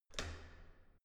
entrance door handle up normal

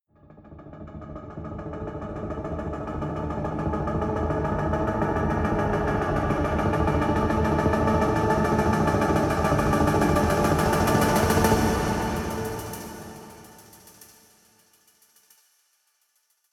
industrial rattle, risers; whooshes rises

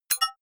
sci-fi click positive light; maschinen; signale; soundpool

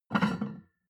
plate on plate take medium, restaurant cafe; sport-freizeit

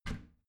bathroom cabinet warped open heavy; objekte; tueren

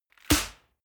snap

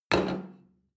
plate place table medium

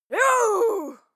female fight attack yell; schreie; menschen

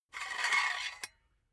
silicone gun empty pusher push slow; berufe; handwerker; soundpool

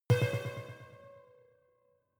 sci-fi vehicle engine stall small; raumschiff

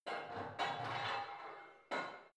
barbell heavy plate put on creaky reverby; sport freizeit; fitnessstudio

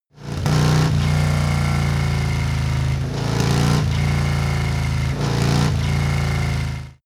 motorcycle harley engine rev triple

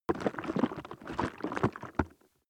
plastic liquid viscous handle, Flüssigkeiten

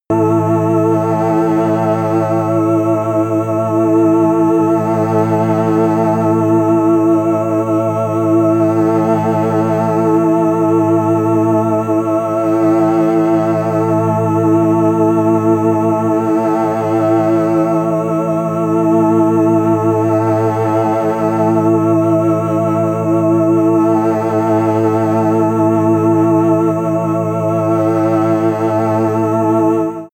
tense organ

